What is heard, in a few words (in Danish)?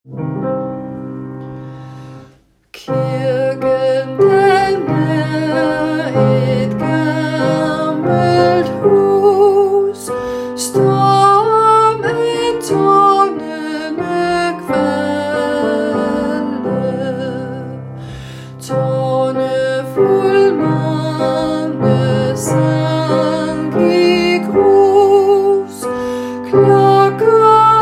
sopran